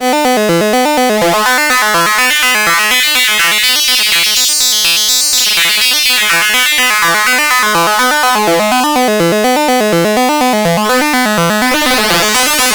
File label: OSC Sync FM Sounds; GRP Module